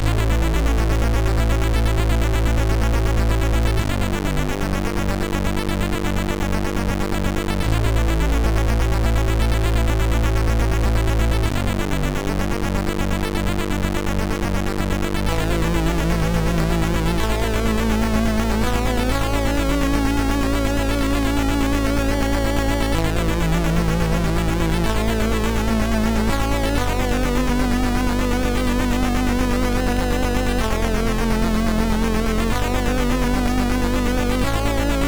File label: Spacesong, MOS6581R3